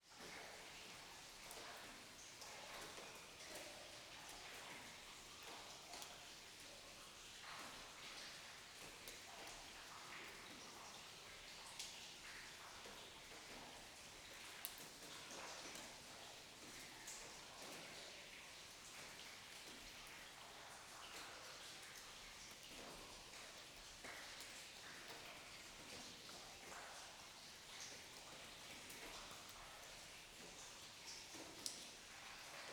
Ambience, Zinc Mine, Running Water Distant Cave Underground; Robin Barstow; The Zinc Mines